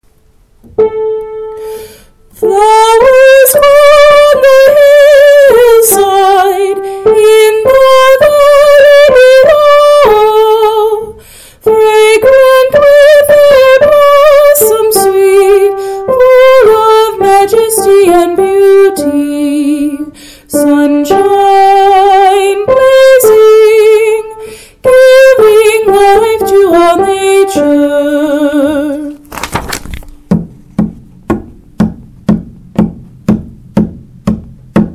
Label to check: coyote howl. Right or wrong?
Wrong